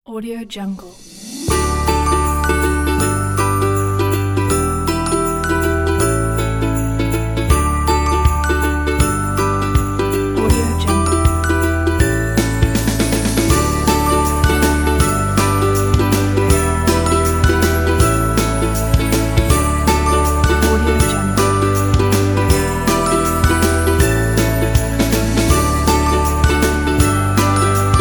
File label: happy christmas ukulele